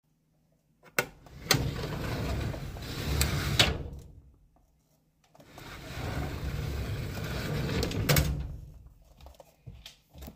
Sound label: Steelcase drawer